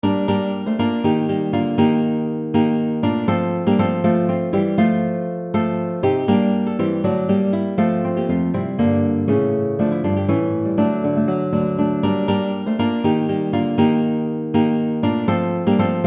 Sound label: Courante; Praetorius, Michael; Courante M.M. Wustrow, TMA 131